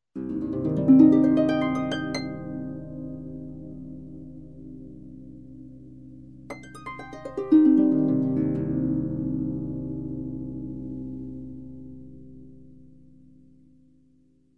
zvuk arfi minornoe arpedzhio vverh i vniz

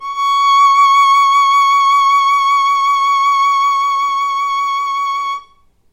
skripka arko vibrato odinochnii c 5

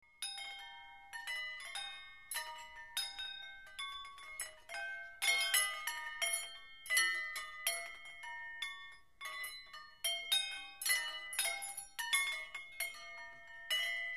zvon kitajskogo kolokolchika

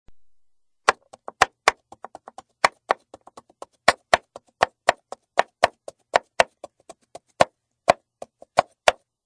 nozh narezka ovoshej

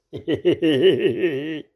smeh muzhchini

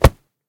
legkij udar po bokserskoj grushe

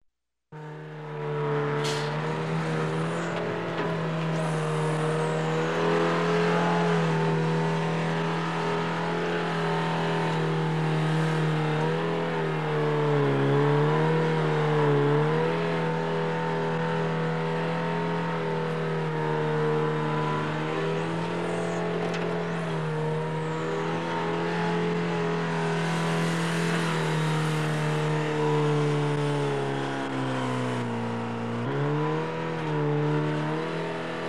mini-traktor rabota s plugom

